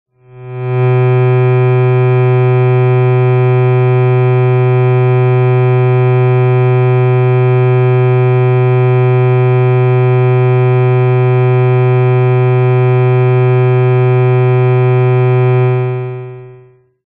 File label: Hum Constant Light Co, buzz